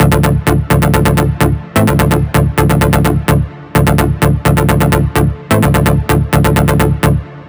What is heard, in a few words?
Melody Kits 128BPM Root D; Vengeance Samples